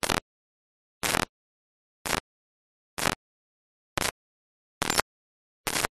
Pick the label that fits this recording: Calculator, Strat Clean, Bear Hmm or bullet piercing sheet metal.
Calculator